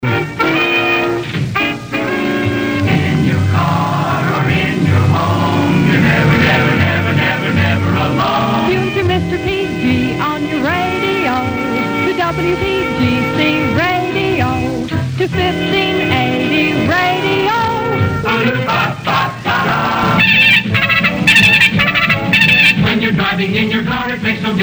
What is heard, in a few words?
jingles montage; futursonic; econ o pac